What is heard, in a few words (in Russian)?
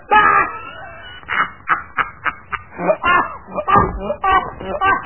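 смех мужской угарный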